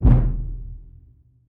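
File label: Music Rituel Migration Perc 120; from dust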